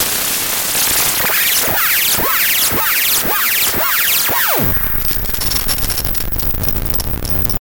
Alien Sounds

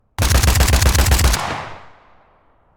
Machine Gun

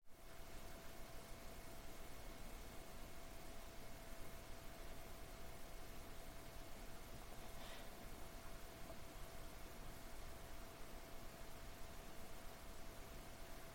Roomtone Bedroom

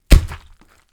Impact With Gore

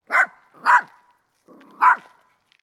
Small Dog Barking

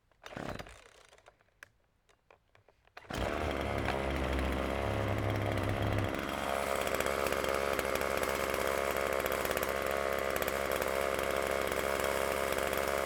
Chainsaw

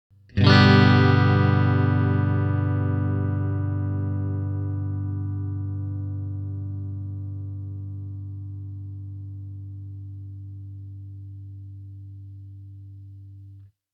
G Major Chord Ringout

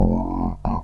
Dragon

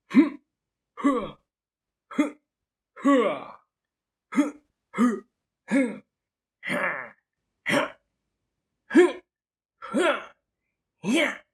Cartoon Like Male Attack Effort Vocal

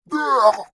Pained Yelp Loud

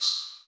Air

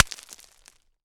Smash And Crumble

